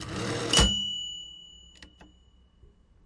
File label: return and ding, Typewriter